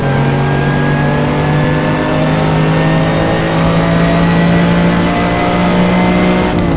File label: third, vehicles; gmod; tdmcars; lam miura